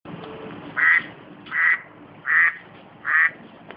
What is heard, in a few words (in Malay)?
bunyi itik